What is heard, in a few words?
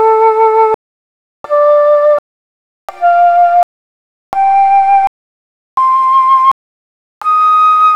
Six Flutes